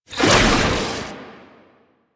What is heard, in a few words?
Archon Whip Whoosh; Enemies; Weapons; Warframe; The New War; Nira